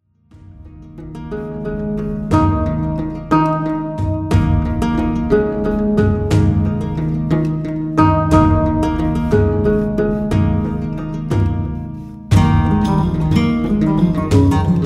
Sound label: CELTIC ALARM